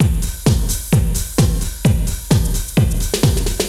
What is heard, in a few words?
Beat (130BPM), Gated Reverb; creative gate; musicradar